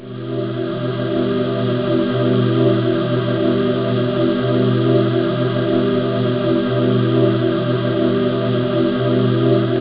female, synth

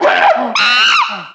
CHIMP; tenpin

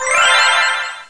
Magic sparkle Sound; HOLIDAY

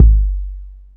Low kick; Drums; audiomulch; simplekit

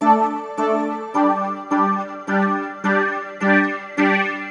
Synt; FUNKACID5

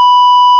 lock

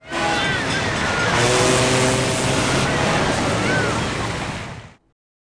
SHIPYRD, buildings

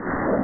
jet; apogee

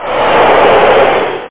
oooh; SPORT; BBC MATCH

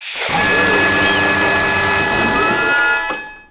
ship arms open; Earth and Beyond; EA Games

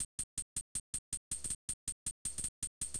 HIHAT 160, Drum Bass; eJay

